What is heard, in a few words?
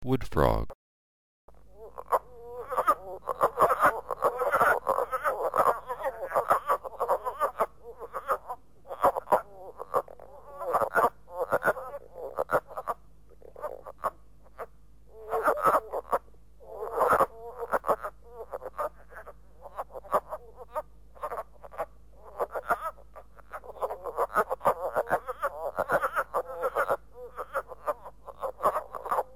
wood frog